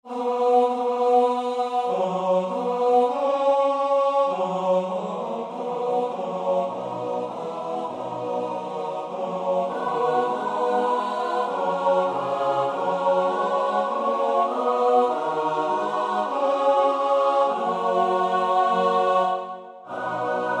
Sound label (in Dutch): S-A-T-B, koorpartij oefening; Thiel (Carl)